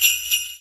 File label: sleigh; garrysmod